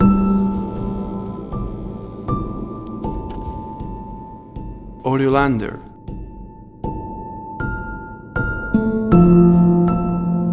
A Dark Piano Theme

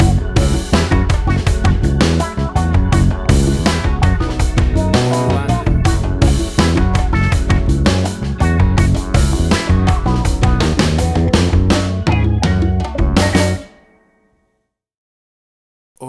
Acid Funk Street Walking

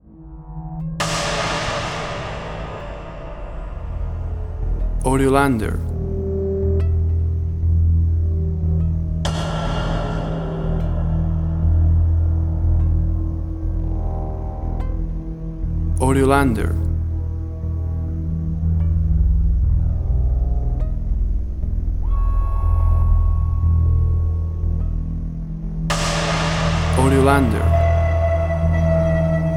Horror Movie Trailer Music With Big Hit

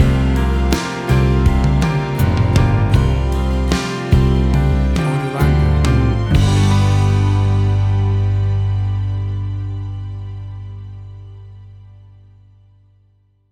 Acoustic Country Soft Rock Ballad Music; 15 SEC

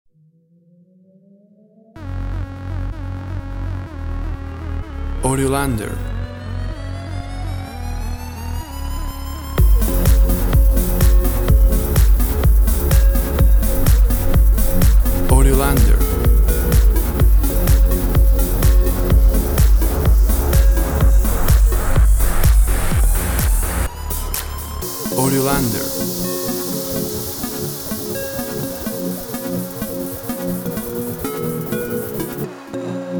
Top 40 Electronic Dance Music

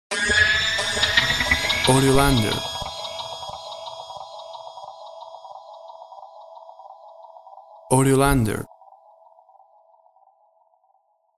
Witches Cauldrun High

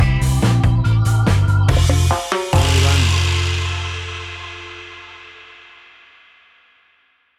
Carribean Ska BBQ Stinger; A hot and spicy carribean island ska BBQ! Classic regg

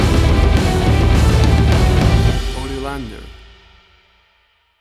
Big Epic Heavy Metal Action Music Stinger

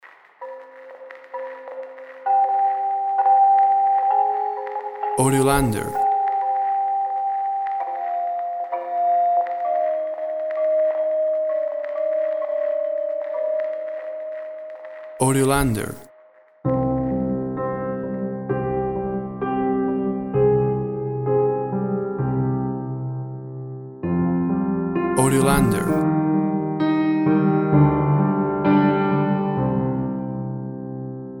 Twinkle Twinkle Twisted Little Star

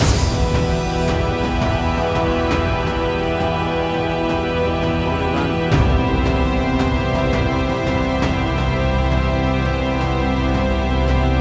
Atmospheric Warm Hybrid Action Track

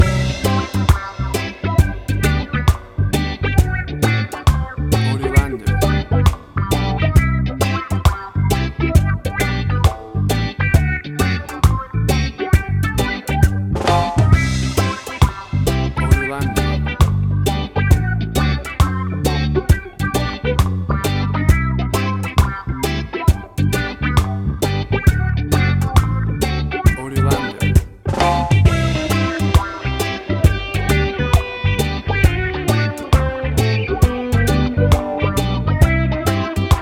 Cute Happy Reggae Summer Music

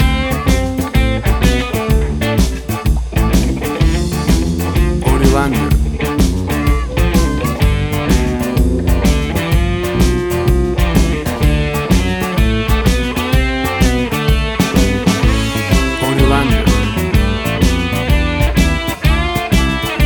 Bluestown Boogie

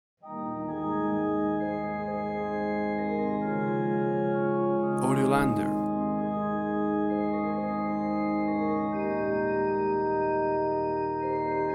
Amazing Grace Church Organ; A traditional and classical version of Amazing Grac

